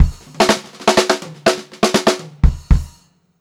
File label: 122 funky fill, funk groove drumloops; USB Soundscan vol.38; AKAI